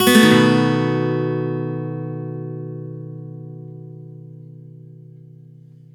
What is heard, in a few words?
One Shot B 7 upstroke, Acoustic Guitar; Sonic Foundry (Sony Creative Software); Songwriter Acoustic Guitar Companion